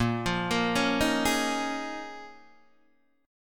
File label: Bb Minor 11th Bb down Guitar DADGAD